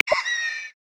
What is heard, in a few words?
Flying Fox, Australia; Xiaomi Redmi-Note-8-Pro